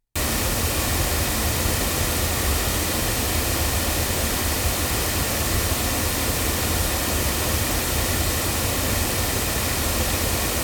MXL 1006BP loudspeakers

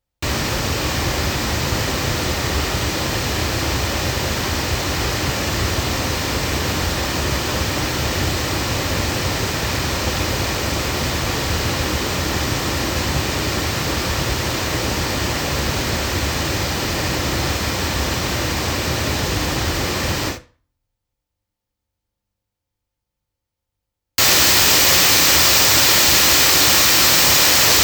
sE R-1 loudspeakers, microphones; se electronics

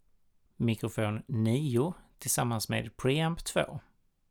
mic pre

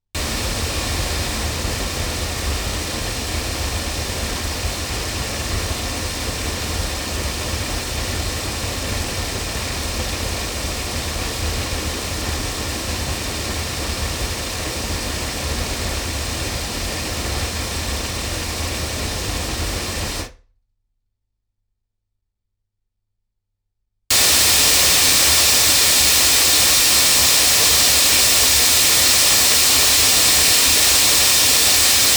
loudspeakers; Oktava MK012 LOMO M3; microphones

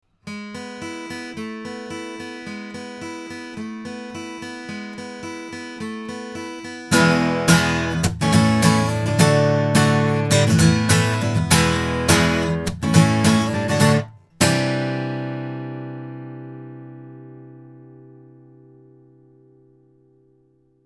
LineAudio CM3 guitar

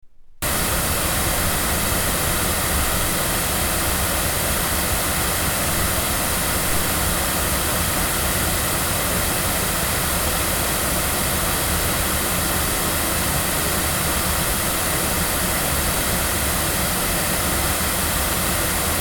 loudspeakers, microphones; Lauten; Horizon

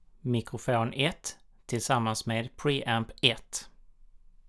mic pre

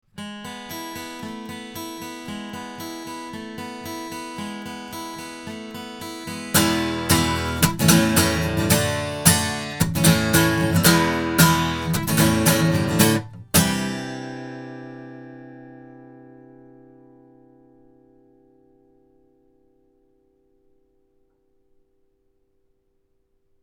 guitar; sE Icis; microphones; se electronics

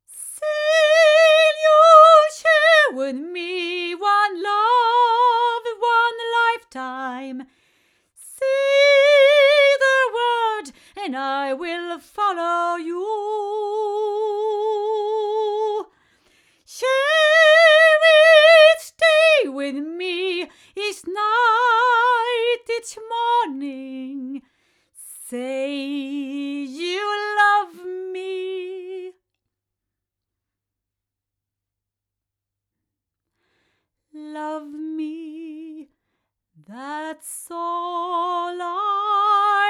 Studio Vocalist female; crowley tripp

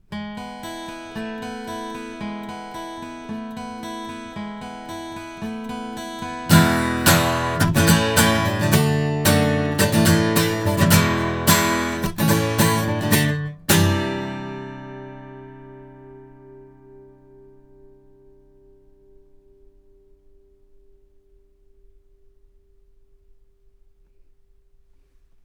cardioid guitar, microphones; Lauten; Clarion

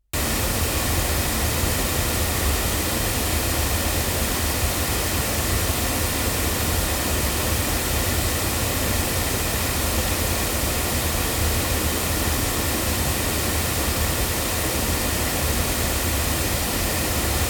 MT87S loudspeakers; TSM

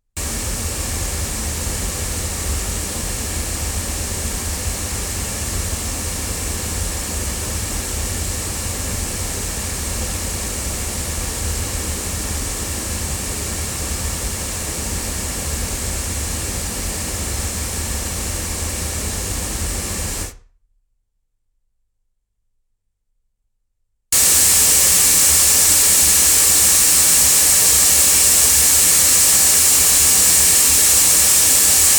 sE 2A omni loudspeakers; se electronics

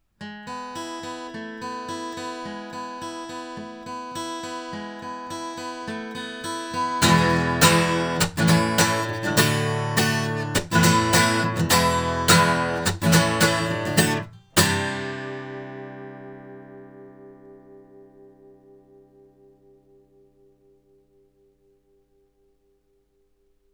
SC-2 guitar; ADK

